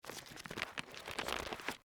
Bible Flip Pages, Bible, Flip, Through, Pages, Book; Cover, Closed